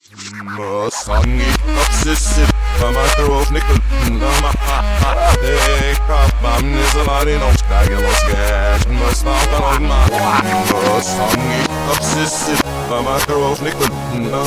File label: song reverse